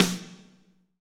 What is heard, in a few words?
SNR, Snares, Roland